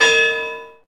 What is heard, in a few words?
Bell; SNES; Bokujou Monogatari (Harvest Moon)